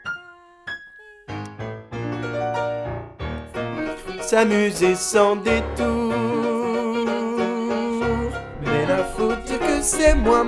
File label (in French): Dernier refrain tenor